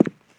walk; sherlockhd

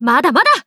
recover; mai natsume

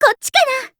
point; celica

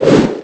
swing twice

